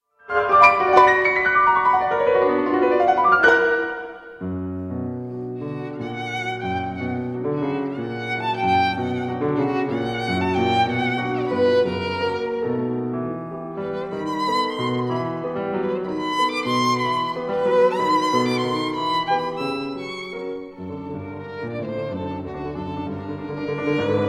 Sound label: sonata in G major for violin and piano; edvard grieg; sonata no 2; op 13